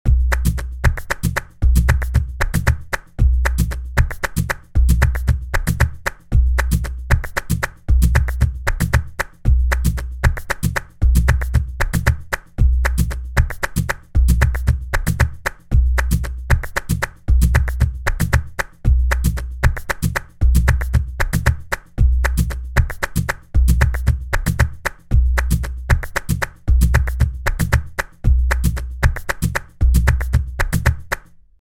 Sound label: Buleria 230bpm, flamenco guitar